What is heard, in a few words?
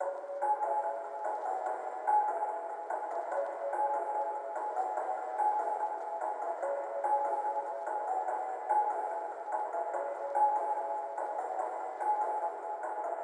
145 BPM C# Min Bell, melody loop stem; Trap; Loop Stems; Cymatics; 2022 Melody Collection; Ferrari